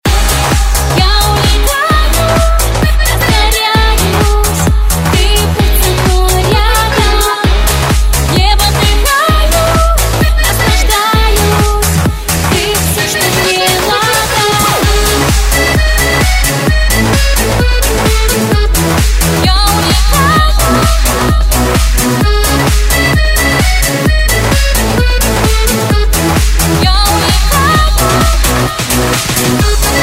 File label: dj energy night club dance